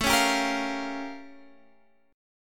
A Augmented 7th Flat 9th A; strummed; 1,3,sharp5,b7,b9; x,12,11,12,11,13; JGuitar

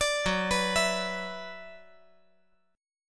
Gb 7th Suspended 4th Sharp 5th Gb

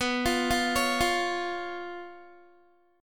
B Suspended 2nd Flat 5th, Guitar; Bsus2b5; arpeggiated; 18,20,17,18,17,x

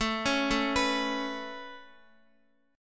A Major Add 9th, Guitar; Aadd9 arpeggiated; x,x,9,6,9,7; 4F,4A,5C,5G,5C,6E

